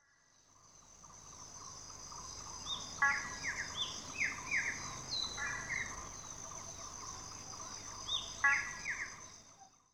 rain forest happy bird

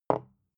bottle put down tipping

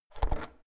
oven knob press out hard

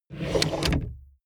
plastic drawer large draw

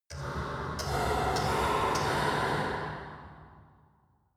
mechanic click

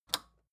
record player power release off

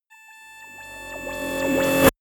rise buzz high

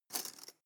cutlery teaspoon drawer grab

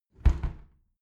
window close tilt regular sequence

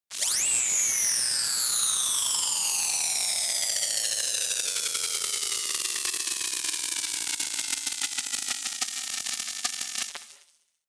noise crackle drop

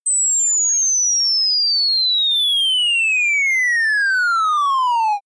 general fall

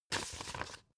old put down

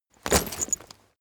leather metal bodydrop

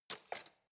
cutlery spoon drawer place

